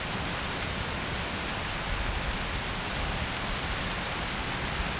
Rain Medium; Ambiances; Platoon

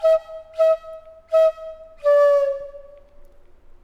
FLUTE ETHNO 125

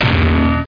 clank

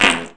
fart; Redneck

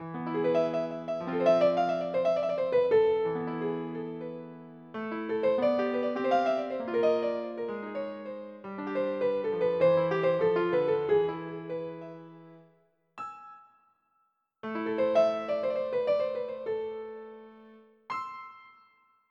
GUITAR; 16 Bit Digital Sound Masterpiece

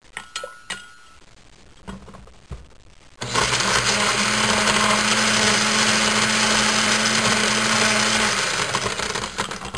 Blender With Ice Cubes

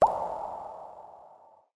Maze Cave; Pirates Of Treasure Island

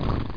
SNORT; landslor

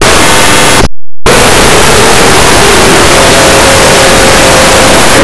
MOO

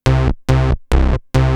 bass; Techno Music Maker

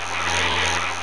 zap; duke nukem total meltdown

